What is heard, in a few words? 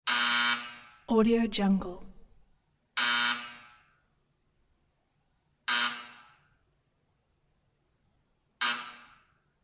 sound stage buzzer makes short buzzes; cartoon